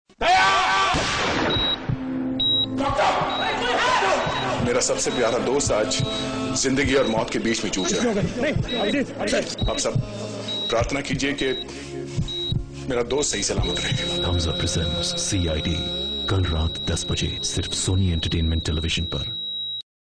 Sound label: indian song